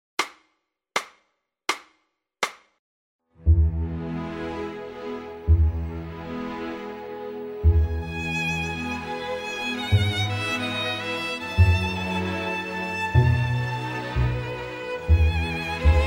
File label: E